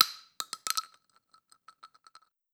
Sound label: casing 12ga; arccw; weapons